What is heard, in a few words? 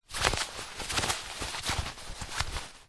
foley fabric thick fast heavy movement; zapsplat